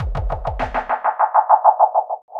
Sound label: drbreak, drumbreaks; Masterbits; Vinyl Attack